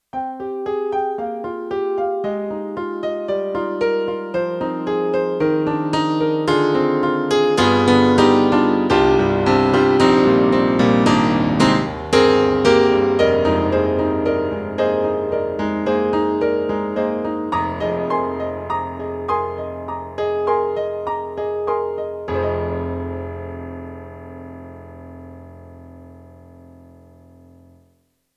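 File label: YAMAHA MU1000EX, Bright Concert Grand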